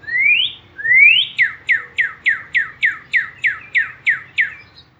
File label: Bird Whistling; Ambient Nature